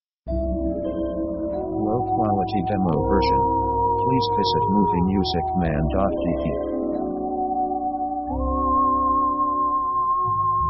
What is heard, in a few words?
orchestra mystic; orchestra themes